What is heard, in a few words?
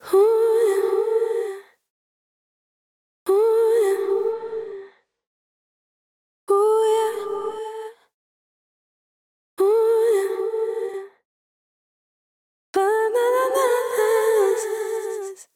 ultratap; Delay-Plugins